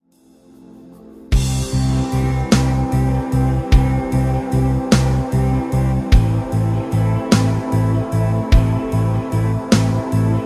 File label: rhythm